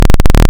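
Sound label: waveform FM sawtooth sine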